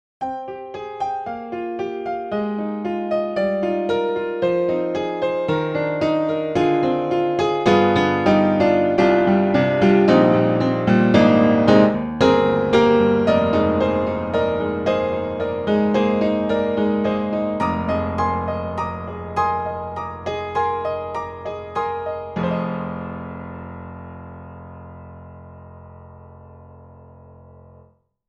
Upright Pianos Modern Upright Modern Upright Piano; Synthogy; Ivory II